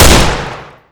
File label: famas; garrysmod